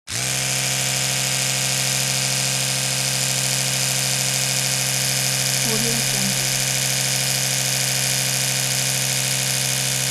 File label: electric toothbrush